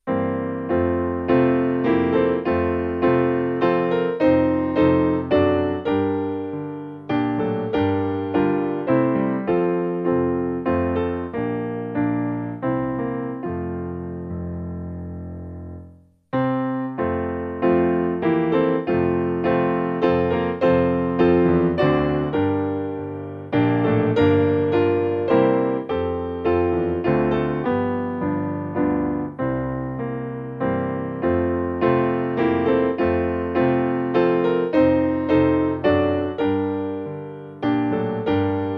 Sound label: Away In A Manger, Cradle Song, Piano; hymns